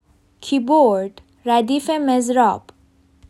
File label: Keyboard